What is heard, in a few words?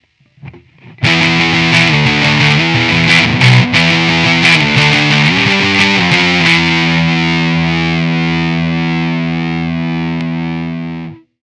riff distortion emulated